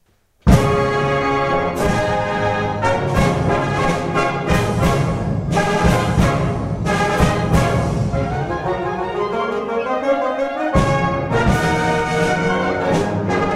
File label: Coronation March